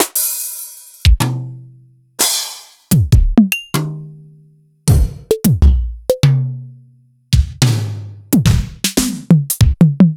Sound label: Yamaha RY20, Samples Sliced; m8